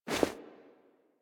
Movement Foley; Warframe; Wisp

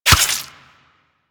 Melee Dagger Impact Flesh; Warframe; Weapons; Daggers

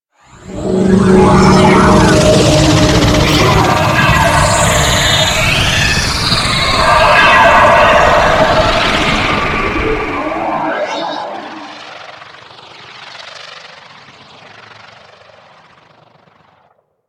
Insect Liset Relay Undocking, Vehicles; Liset Flight

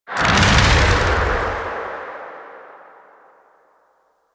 Sandstorm Impact; Warframe; Mummy

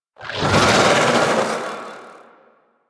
Vocal Attack; Warframe; Sentients; Conculyst

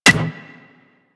Melee Staff Impact Generic; Warframe; Weapons; Staffs

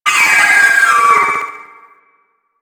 Trooper Vocal Death; Warframe; Sentients

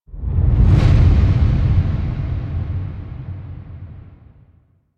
Sword Grab Intro Transition; Chimera; Ballas

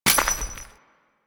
Step Dirt, footsteps; Warframe; Grineer Royal Jester; Foley

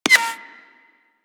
Bullet Impact Ricochet; Warframe; Weapons

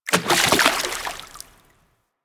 Fish Jump Splash A; Eidolon; Warframe; Ambience; Eidolon Plains

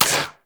Pistol Mech; Warframe; Tenno; Priest; Weapons

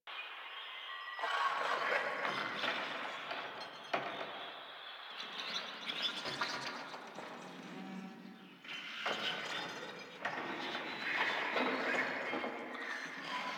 Camp Exterior Large Loop; Ambience; Props; Loops; Warframe; Venus Open World; Venus Landscape

